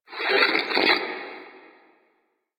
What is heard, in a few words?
Video Stop; Warframe; NineteenNinetyNine; Stage6TheCatCameBack